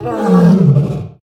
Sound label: Death